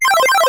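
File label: Platformer Powerup Pickup Reverse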